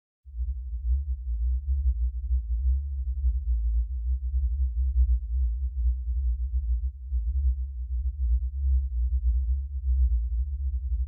Subwoofer phase: 62Hz; Subwoofer Evaluation and Setup; Surround Spectacular

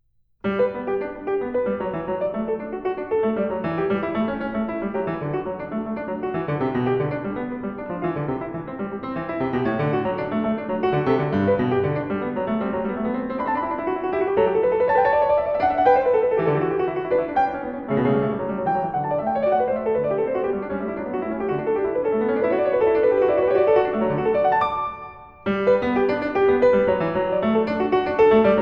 Variations, for keyboard

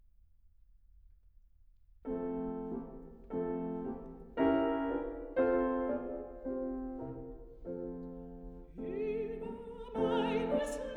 song for voice & piano; Geheimes; Schubert

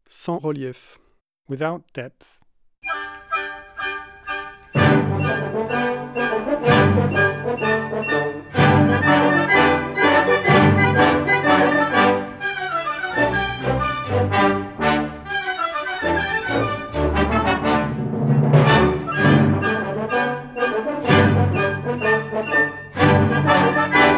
Full fledge orchestra

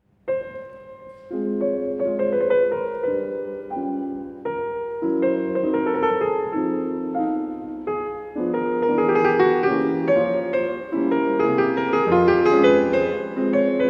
Mazurka for piano No. 49 in F minor; The Piano Collection, CD 2

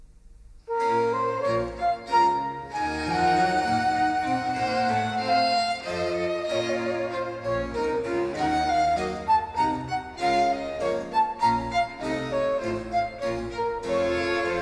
Orchestral Suite in B minor Menuet; BWV 1067; J.S. Bach; 4 Orchestral Suites

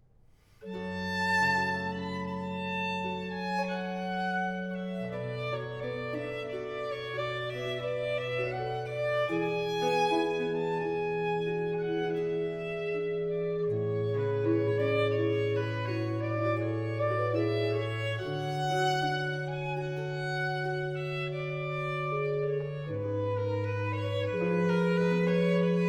violin, strings & continuo; D minor; Largo; RV Anh． 10; Vivaldi; Concerti per Violino

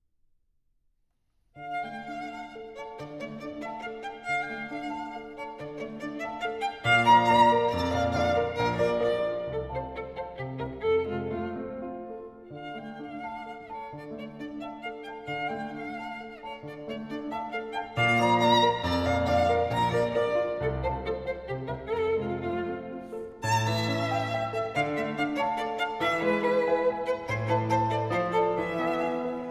piece for violin & piano in D minor

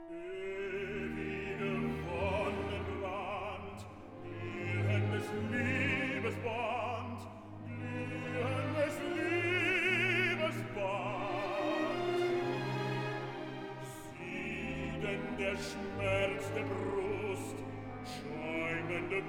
Symphony in E-flat major; Part II - III. Ewiger Wonnebrand; Mahler Symphony No 8

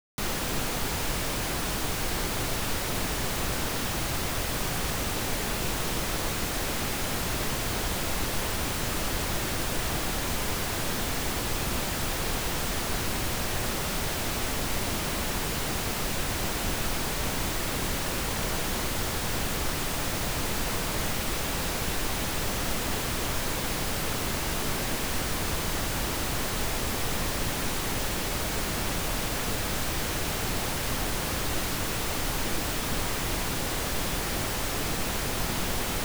Reference Tones Left-channel pink noise; Surround Spectacular

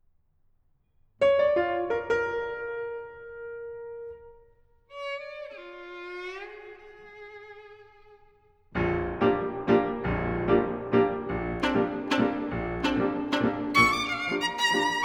Preludes for piano: No. 1, in B flat major

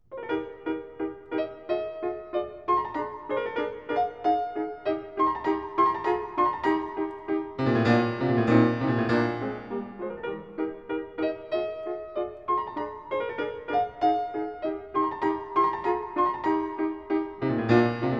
Variations and fugue for piano in E flat major

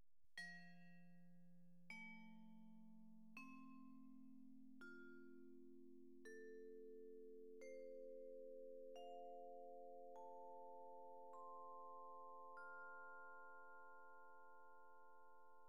Converter Resolution Tests: -40db Dither

